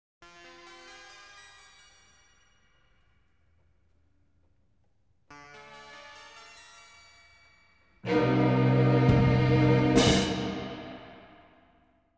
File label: open trapdoor; the evil dead score